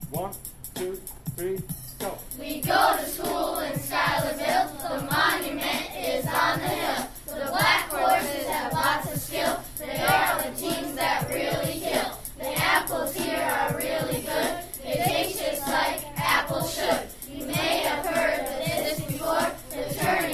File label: rap; schuylerville